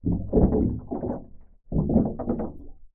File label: hydrophone, submerged, large plastic, water, foam, gurgling, gentle; Eiravaein Works; Unfathomed